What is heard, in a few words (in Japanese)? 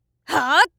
【攻撃】はぁっ; 戦闘